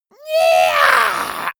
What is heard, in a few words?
monster scream high